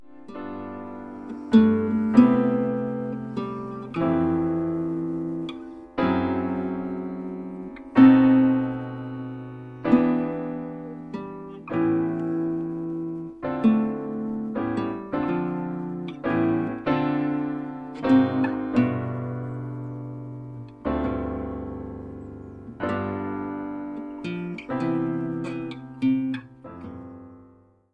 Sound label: chords guitar; alice in wonderland